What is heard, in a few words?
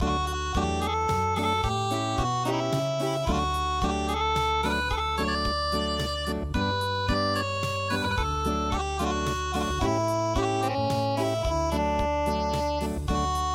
The Alphabet Song Unplugged